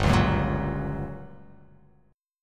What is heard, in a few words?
G Minor Major 7th Flat 5th, G, down